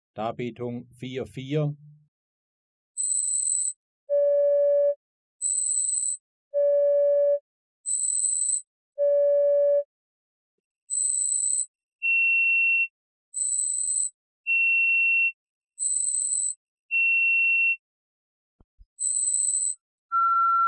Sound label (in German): Verhältnistonhöhe; Die Verhältnistonhöhe wird durch Vergle